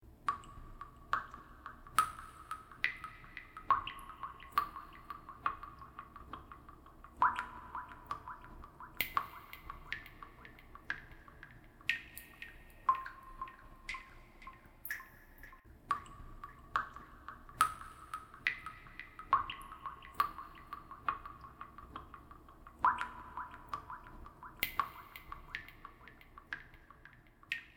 ディープキス; よしよしあまあまタイム; 安堵

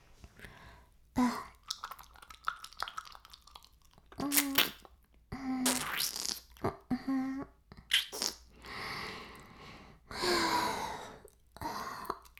耳舐め音声, ASMR; 白くて黒い魔性円環

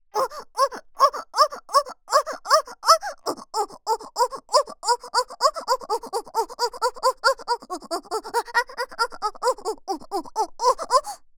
おっ！♥おっ！♥おっ！♥おっ！♥

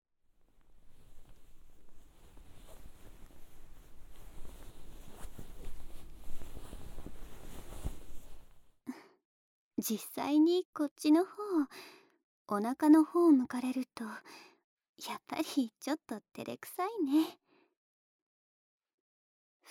引き続き 耳掃除～左側

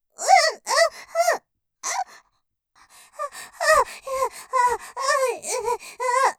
絶頂余韻 喘ぎマシマシ; ステレオ

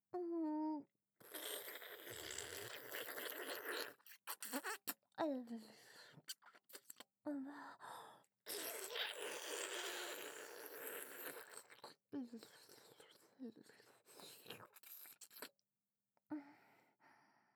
フェラゆっくりれろれろ; 喘ぎ、耳舐めのみ

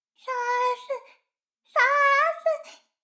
シコシコ音声; 逆時間停止されて

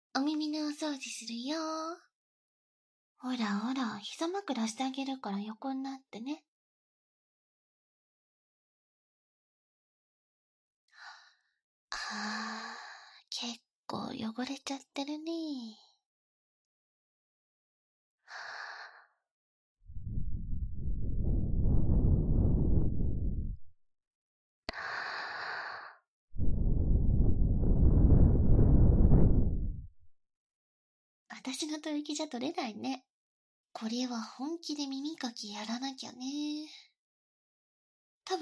甘々耳かき（右側）

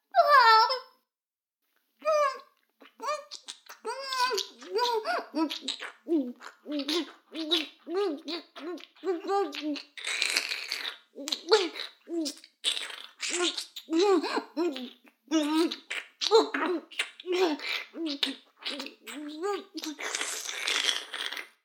フェラ音声; 逆時間停止されて

